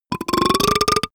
monster burp; comedy-cartoon; soundpool

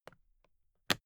briefcase plastic latch lock close unsteady; industrie wirtschaft; bueroarbeit

